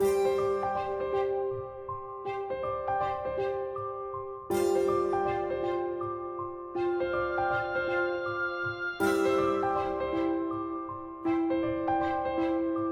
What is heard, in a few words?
Office Normal; code shifter